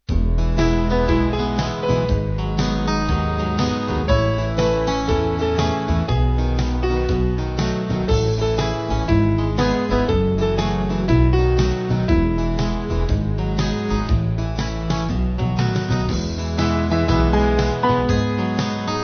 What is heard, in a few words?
Band